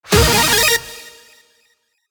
Practice Combo Challenge Clear; Tekken 8 CNT online; PS5 Windows Xbox Series XS

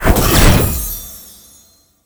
SFX spell harness magic

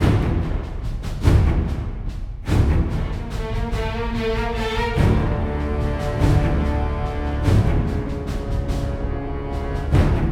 Fight Theme Tension Fast Start; ryse son of rome 2014

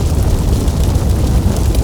Flame (Loop); Sbp Duel Of Scales

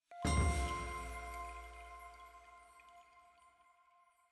item Jingle Bell chime Perc F

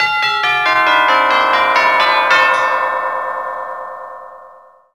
Jingle High Score; Nippon Shuzan Renmei Kanshuu Itsudemo Soroban DS

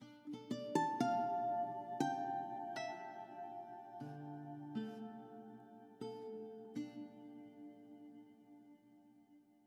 Citadel Harp; Frozen Flame; windows

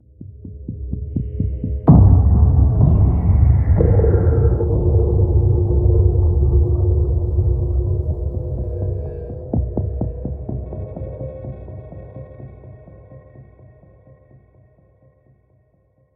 Sneak

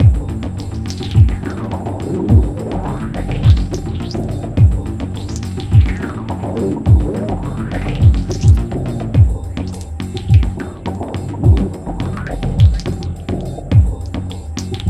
Fight Low Lowlife; Remember Me

